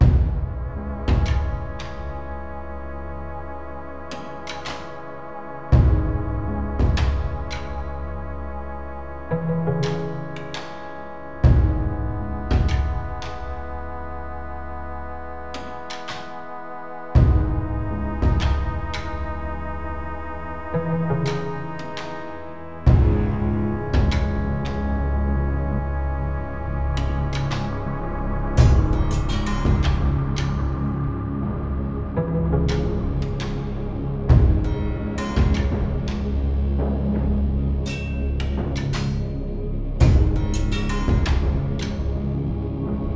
Caves Loop

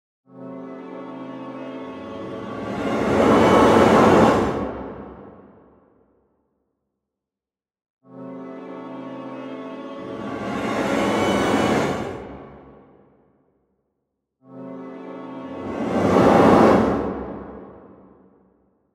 Jumpscare

